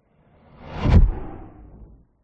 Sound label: sound design whoosh heavy hard fast impact; zapsplat